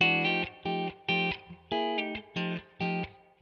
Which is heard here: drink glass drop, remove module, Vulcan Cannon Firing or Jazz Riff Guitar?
Jazz Riff Guitar